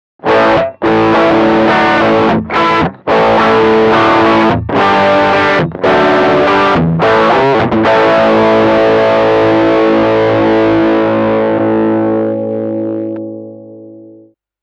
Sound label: Guitar Crunch Amp